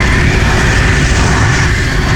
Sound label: Fire Tornado Mid Loop; Spinjitsu